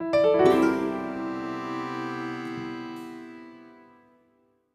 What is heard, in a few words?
Music Sting; lego dc super villains 2018 nintendo switch gamerip; MUSIC HUB TAPSM STING